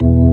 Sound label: Org3 E2; Best Service Gigapack 2